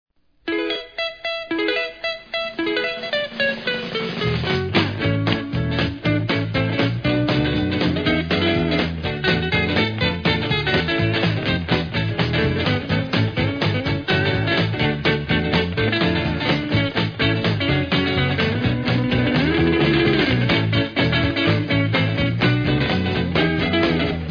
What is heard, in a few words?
instrumental medley